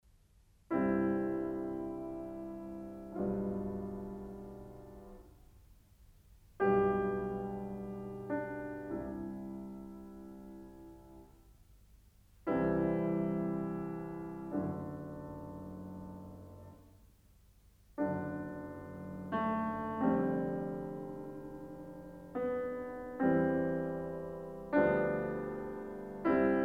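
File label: Piano Sonata No. 4 in E flat major; Wilhelm Kempff; Beethoven; Piano Sonatas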